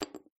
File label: chip put down table